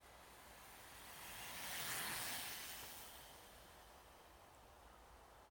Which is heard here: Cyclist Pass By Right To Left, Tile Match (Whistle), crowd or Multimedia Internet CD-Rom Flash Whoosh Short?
Cyclist Pass By Right To Left